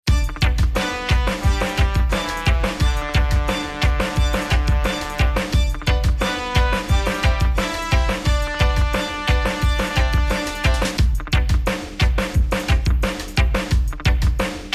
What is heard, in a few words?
Reggae; Loco Roco